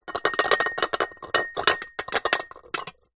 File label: household stacked crockery wobble movement